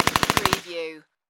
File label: Machine Gun UZI Model A Long Burst